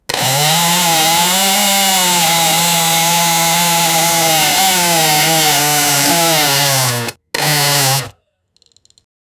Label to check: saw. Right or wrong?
Wrong